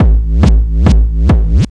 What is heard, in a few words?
Kick Loop, Drums, Dance Beats